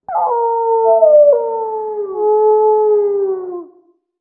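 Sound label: animal wolf distant; modern warfare 2; amb elements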